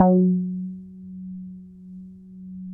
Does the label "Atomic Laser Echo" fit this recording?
No